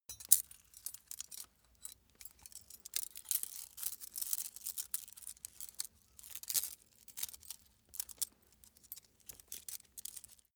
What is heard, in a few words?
small metal handling jingle long; industrie wirtschaft; metall